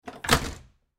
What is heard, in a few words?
Screen Door Garage, Screen Door Garage Entrance Close; Screen Doors; Household; Blastwave